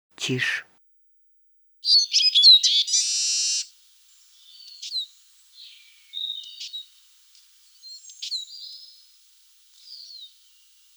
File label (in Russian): голос чижа